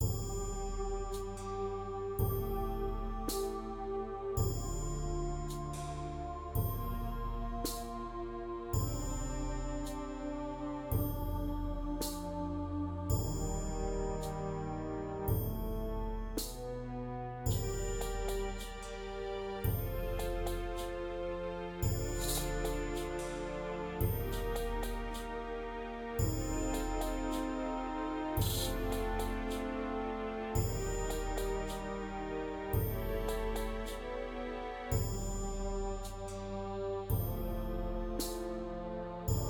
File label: Calm; Ibrion; primordials battle of gods windows gamerip 2021